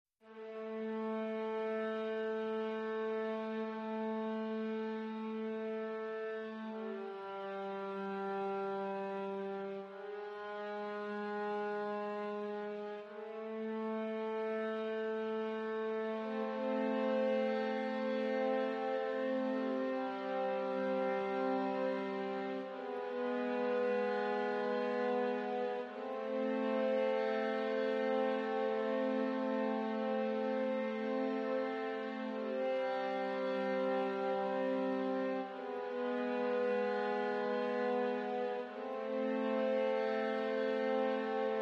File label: Quartet Mix